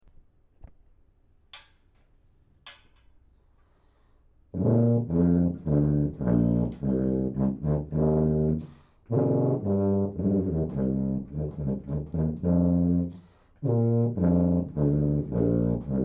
tuba

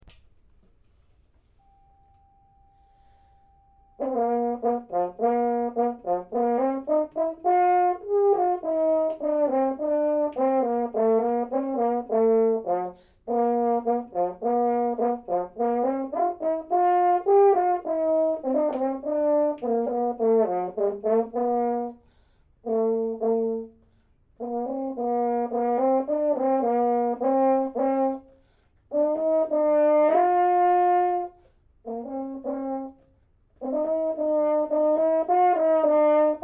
horn

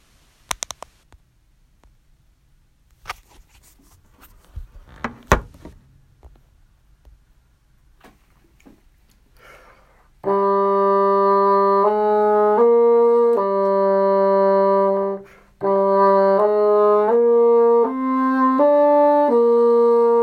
bassoon

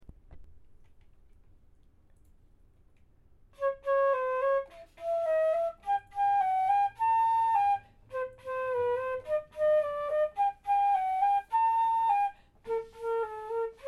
flute

